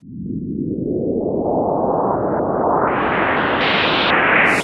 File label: Air FX, Filtered Noise